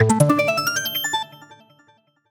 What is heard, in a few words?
Action Enter (Electro); Sound Ex Machina